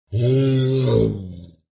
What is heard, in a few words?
zombie pain